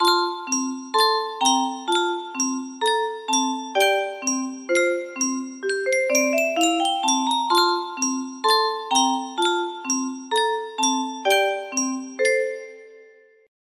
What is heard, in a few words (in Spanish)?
yunsheng caja de musica